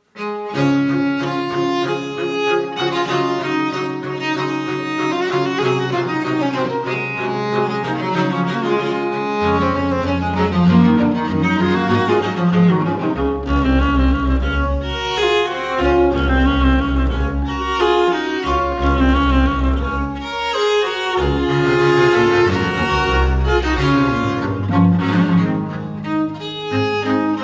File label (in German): Divertimento D Dur Allegro; Haydn; Sperger Trio